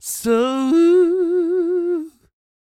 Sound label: croon, Vocal Planet Blues Soul; Spectrasonics